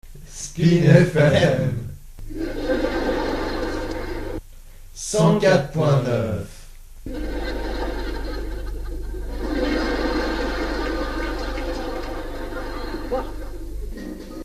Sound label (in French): rires; spin fm aime les rires